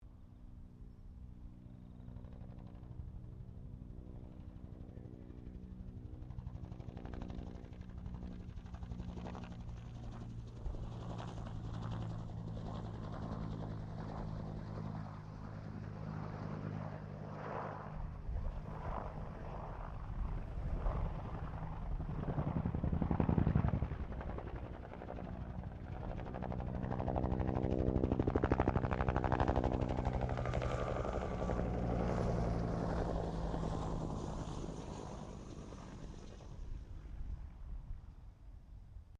HELICOPTER BELL UH1 IROQUIOS PASS; RAN UH-1 Iroguios; Helicopters; Sound Effects:Vehicles

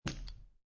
Poker Chips Place Stack Of Chips Gently On Felt; Gambling

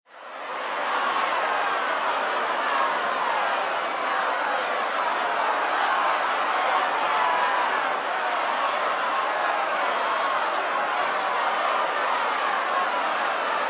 Sports Crowd Boxing Match Close Perspective

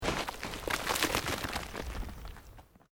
Earth Loose Move Short

